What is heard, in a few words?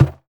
wall cone; Original TK Sounds